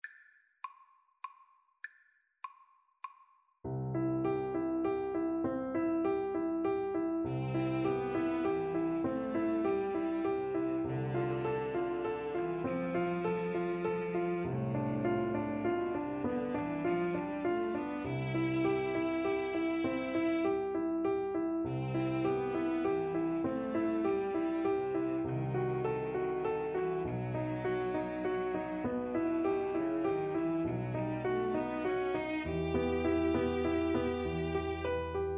Piano Trio

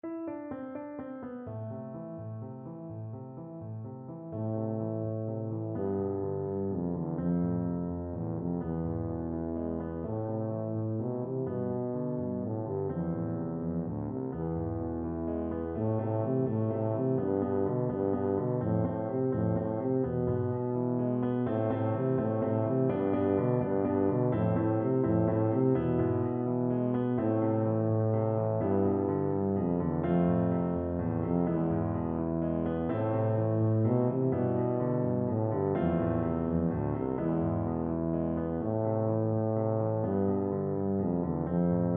spanish violin, tuba

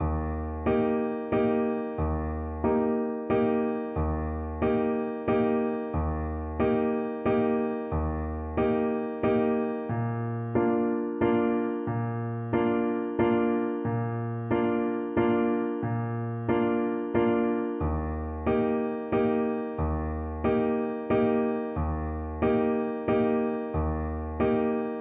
horn, French Horn

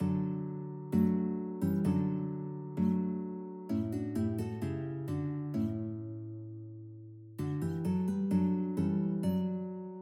thine be, guitar

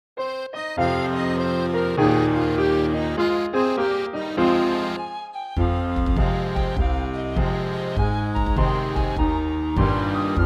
bonapart crossing the rocky mountains trumpet

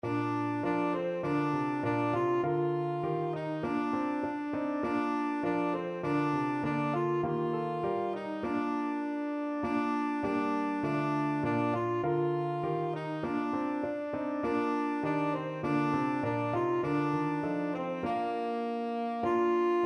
angels, tenor saxophone

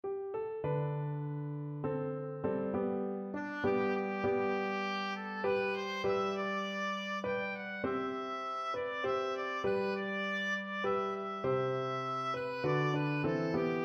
Shenandoah, oboe

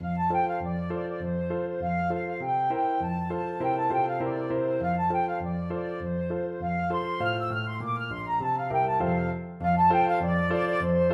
arkansas traveler flute

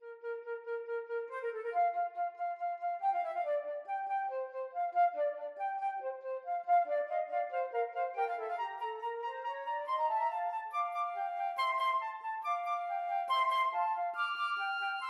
Magic Flute Overture flute

